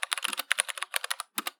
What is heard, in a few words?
keyboard typing